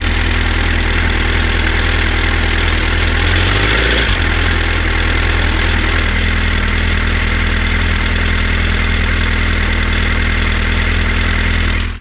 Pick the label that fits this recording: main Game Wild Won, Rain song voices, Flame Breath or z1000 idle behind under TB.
z1000 idle behind under TB